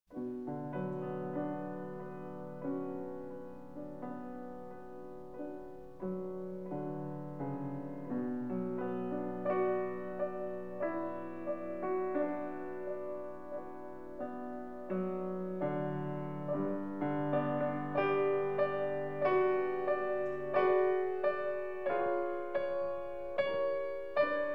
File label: Prelude in B minor Op 28 Nr 6, Piano; Raindrop; Chopin; Vladimir Horowitz